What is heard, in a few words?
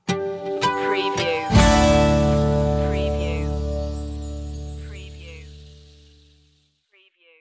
water gardens sting